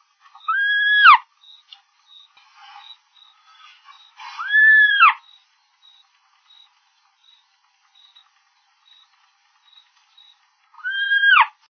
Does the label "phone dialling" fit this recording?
No